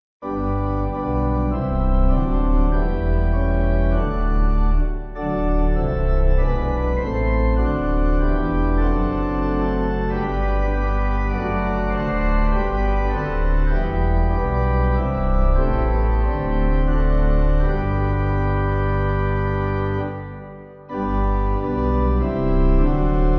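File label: Pipe, Pipe Organ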